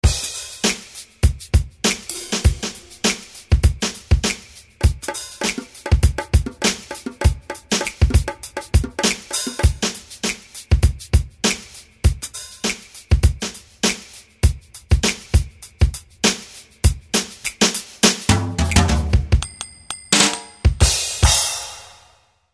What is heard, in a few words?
drums kit brush